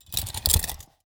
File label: Emergency Handcuffs Placed In Holster; emergency effects; AdobeCC